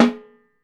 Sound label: RIM, X-STICKS; Sampleheads; New York City Drumworks VOL-1; KD X-STICKS